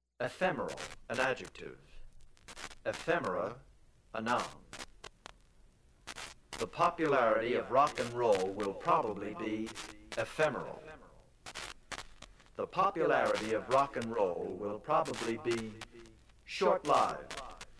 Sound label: electric